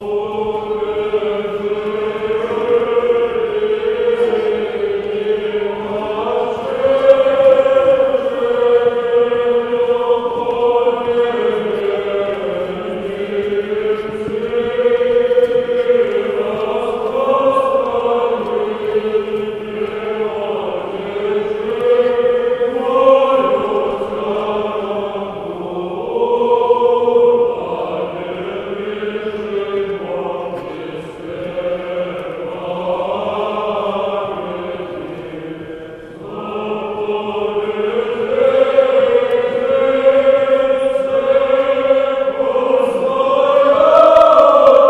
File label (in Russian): Рогожский хор 5 глас ирмос 6 песни